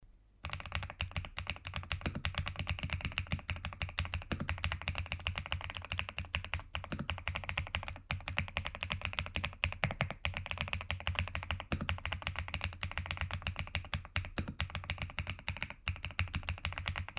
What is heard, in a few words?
cypher81; epomaker cypher 81